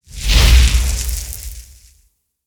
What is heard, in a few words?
fire